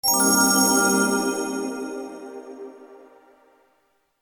Bell Chord

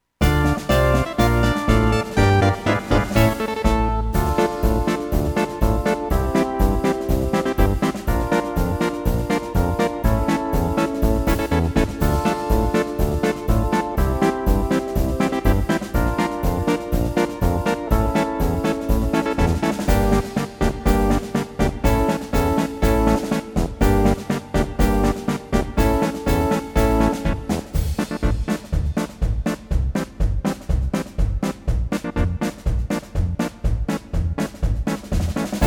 8 Bit March; The 8-Bit March

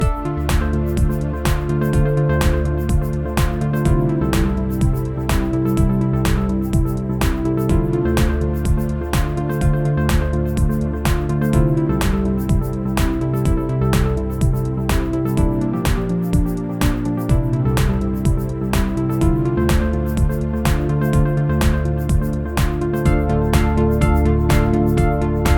Mellow Puzzler, puzzle music

